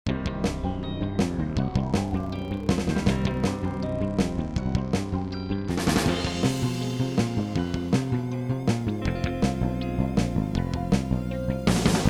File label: Background Music; Detective Barbie